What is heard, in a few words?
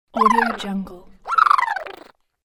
gurgling cartoon bird; fxprosound